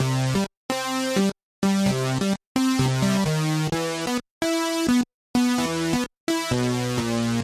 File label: funky house synth loop, 129 bpm